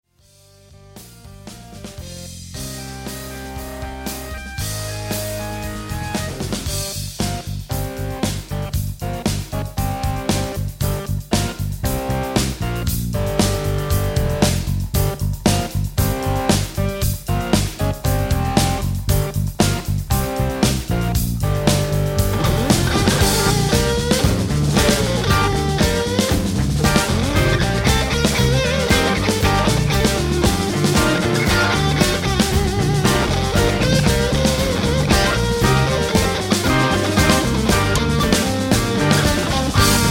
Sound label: Red Fuzz